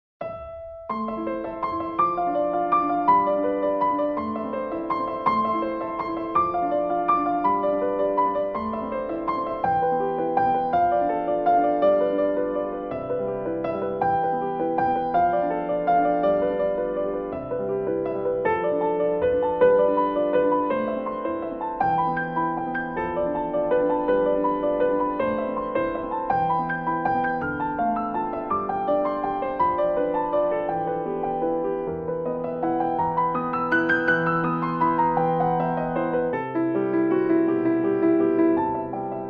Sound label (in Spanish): Serenata De Piano